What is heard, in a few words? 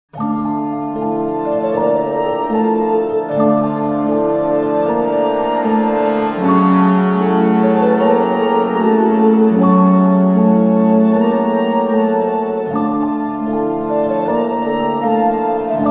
Magical Transition